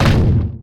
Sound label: metal fall; Golden Crayon Sounds